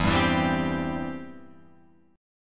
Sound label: E Minor Sharp 5th, Guitar, Open F; Em#5 strummed; 4,2,4,2,3,2; JGuitar